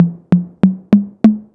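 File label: TR-808 high tom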